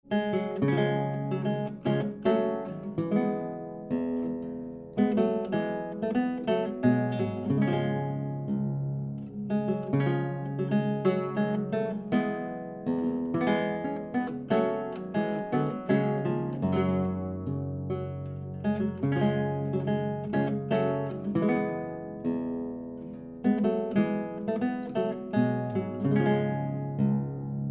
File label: Romanesca Schoeps MK2s; Romanesca II Schoeps MK2s; FreeJazz